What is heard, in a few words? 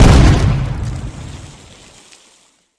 pop; flood; carrier; predatorcz; halo